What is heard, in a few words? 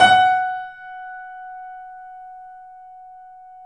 F#, The Piano; InVision Interactive - The Piano